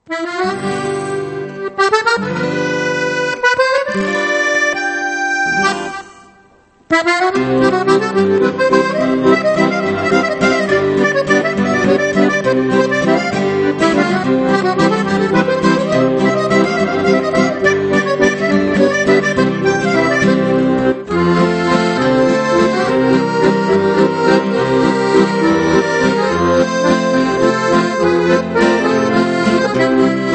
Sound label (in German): Akkordeon Musette; Salon Pernod